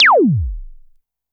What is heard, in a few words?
korg trident